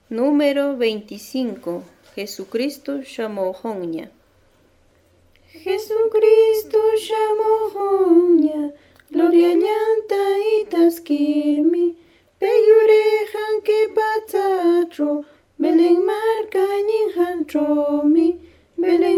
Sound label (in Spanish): cancion